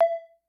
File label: Multi, Vermona DRM1-MK2